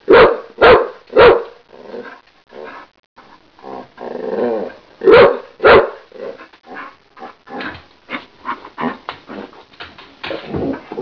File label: Bark